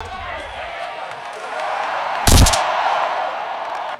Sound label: massive crowd roaring with rapid pyrotechnic pops; crowds